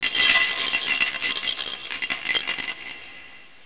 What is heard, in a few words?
STONES